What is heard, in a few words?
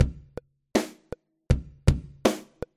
kick snare